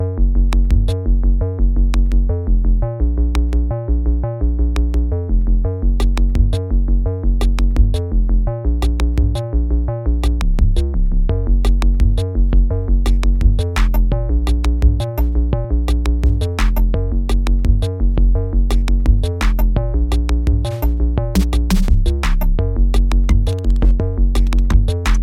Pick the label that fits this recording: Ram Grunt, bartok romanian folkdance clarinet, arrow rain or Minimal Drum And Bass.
Minimal Drum And Bass